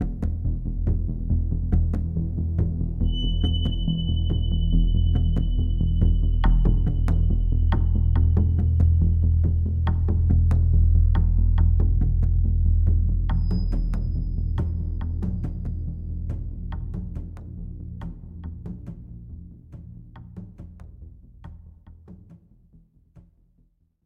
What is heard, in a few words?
sting Tense; the walking dead michonne 2 give no shelter